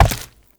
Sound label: Step Flesh; Warframe; Uriel